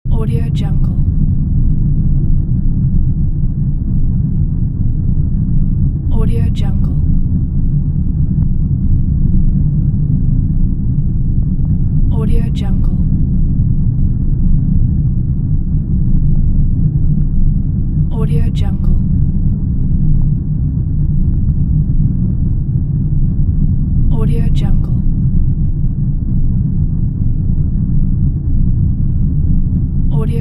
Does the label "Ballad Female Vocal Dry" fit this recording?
No